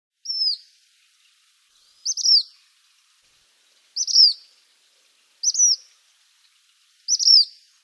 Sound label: Black Phoebe; Birds